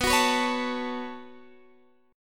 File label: C sharp 7th Suspended 4th Sharp 5th B